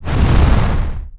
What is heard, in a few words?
attack; shinobi